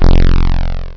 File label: Wwwommm; After Dark; Maze 2.0ß